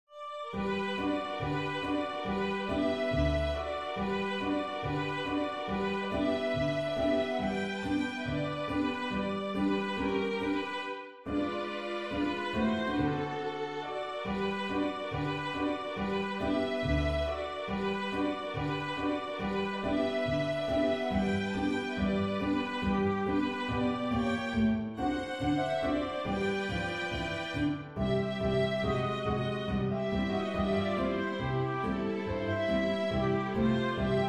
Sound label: ragtime full